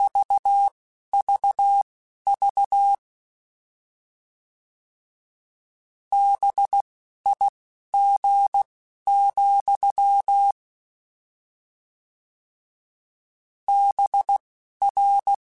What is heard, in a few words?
Morse Code, CW Code Groups; Mixed